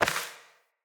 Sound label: Sparrow Death State Impact; Animals